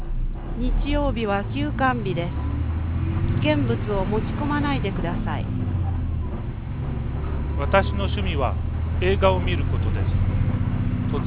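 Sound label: bus, noise canceller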